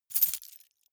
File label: key ring large rattle air soft; objekte; schluessel